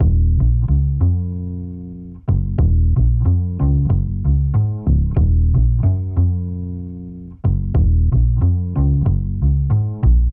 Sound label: Bpm93 Bb Vintage Jazz Double Bass, Basslines